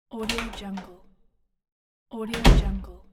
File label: door open and close